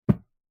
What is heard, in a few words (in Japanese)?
脱いだ服をシーツの置く音